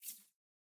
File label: Fusebox Spark